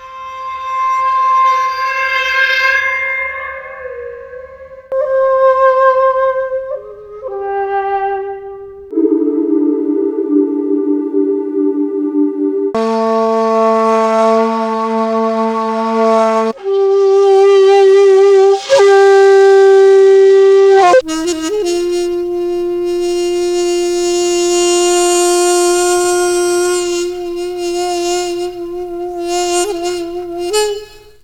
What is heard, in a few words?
Woodwind; FutureMusic